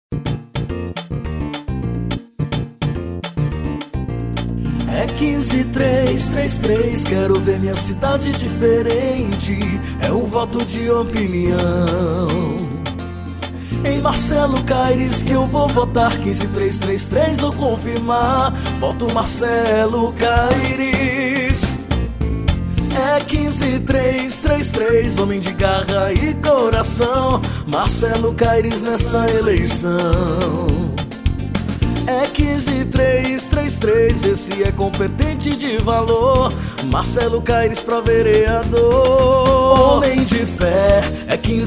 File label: Jingle